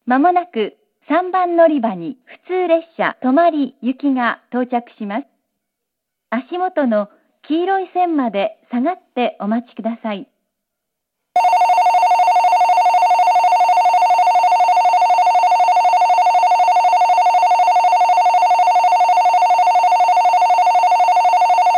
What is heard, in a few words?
local tomari, itoigawa station; echigo-tokimeki-railway